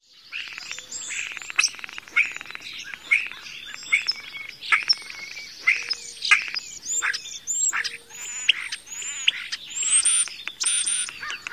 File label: song